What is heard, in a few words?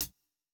pop hihat